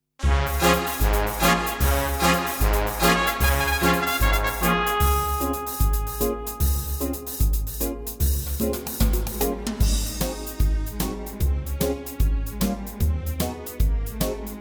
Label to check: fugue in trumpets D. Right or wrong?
Right